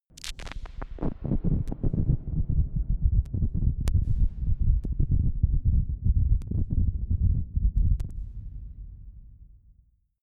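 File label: crushed fake thunder, science fiction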